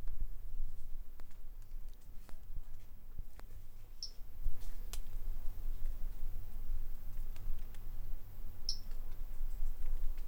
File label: Brachycephalus tridactylus; MR Bornschein